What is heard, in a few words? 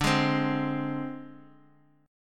Ab Major Flat 5th D